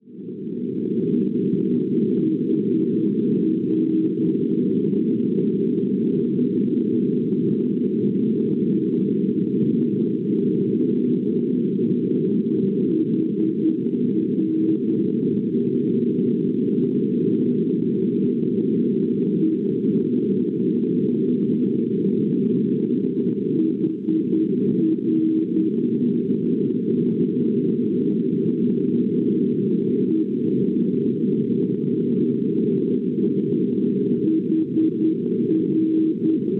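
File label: non-directional beacon 289 kHz; HR; Hericourt; Francia; Schwarzsee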